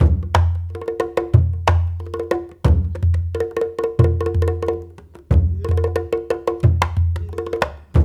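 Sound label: 90 bpm, Nyabinghi; Spectrasonics; Supreme Beats; African Contemporary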